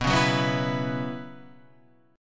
B Suspended 2nd Suspended 4th, Guitar, Half Step Down